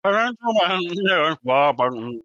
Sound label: Gibberish